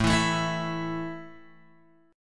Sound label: A 5th A down Guitar Open G